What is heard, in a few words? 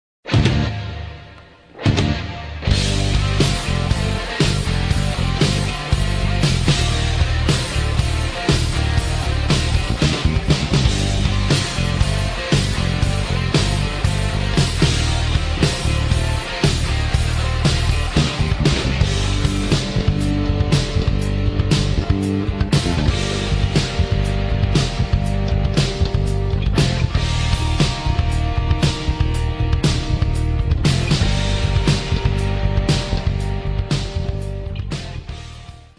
karaoke